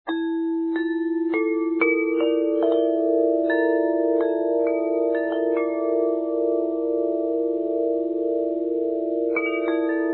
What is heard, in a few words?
Ten, chime